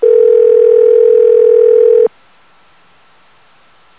ringback; Netscape Conference

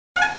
sqeak; Interactive Media Design Review 1999

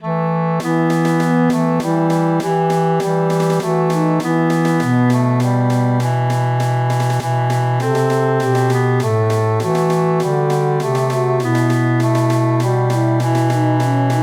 lose

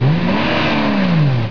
Recycle; Ferrari F50

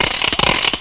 Rattle

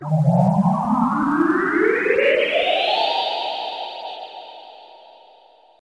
SYNTH PAD AMBNT 160 C, Ambient; eJay; Sphere

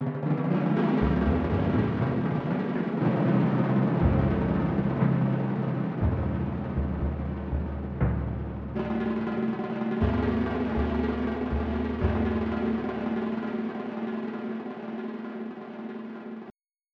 Drum FX

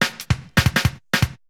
DRUMLOOP D+B 160, Drum+Bass Loop; eJay

